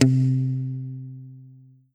Roland SC-88 Muted Electric Guitar C3

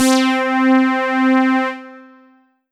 Yamaha MU90R Analog SQ C4

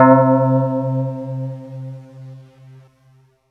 Yamaha PSS-170 Honky Tonk Piano C3

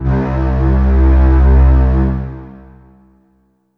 Korg M3R New Strings C2

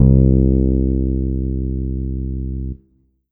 Fretless C2; Korg NS5R

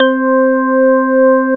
Casio VZ 10M Cool Organ C4

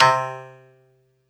Ensoniq SQ-1 Koto C3

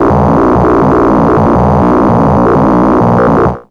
Yamaha PSS-280 Alien

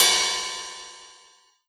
Roland D-20 Ride Cymbal

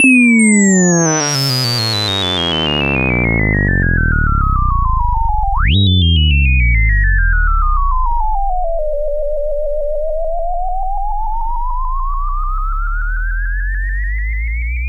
Yamaha DX7 Lasersweep

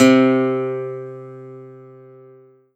Yamaha MU90R 6 String GT C3

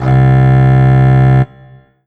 Alesis Fusion Contrabass C2

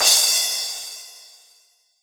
Yamaha TG100 Crash Cymbal

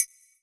Korg N1R Mute Triangle

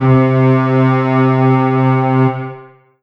Yamaha TG77 String Quartet C3

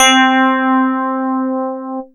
Yamaha TX802 Electric Piano C5

